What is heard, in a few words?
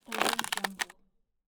bow draw